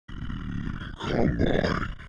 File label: alert; scmod; humanerror; agrunt